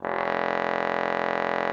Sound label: trombone F1; Xsample VOL-3